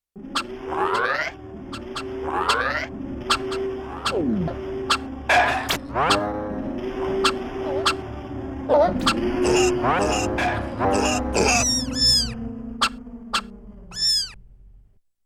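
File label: Animals percussion & sound effects; Yamaha TX16W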